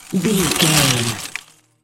ice spell freeze ground

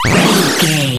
retro powerup collect

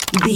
lever small wooden

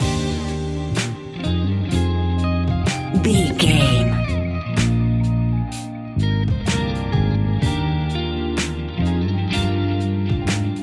Late Night Beach Beat; Late Night Beach Beat 15 Sec

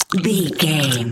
zombie eating chewing

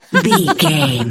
voice female laugh

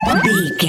collect item

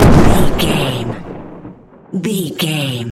explosion med long tail

